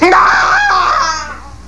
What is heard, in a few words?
NNGAAAH